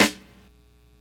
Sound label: long tail reverb snare g key; snare drum samples